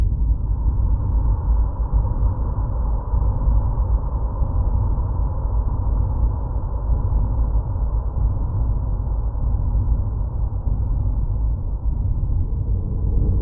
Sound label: scary atmo; Inquisitor 2